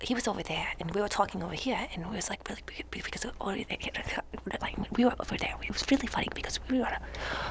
whimper; Mafie 1

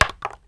wood; Mafie 1

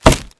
wood; Mafie 1

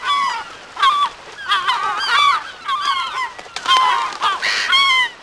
gull; Mafie 1

